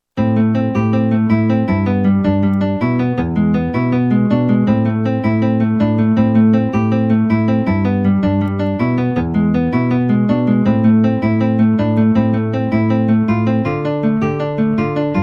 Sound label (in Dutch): gitaar